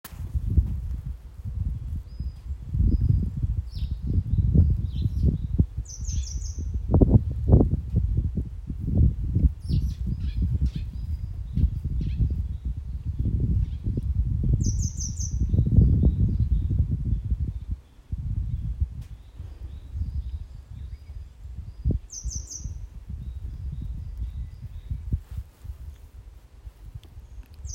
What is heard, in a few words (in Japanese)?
saezuri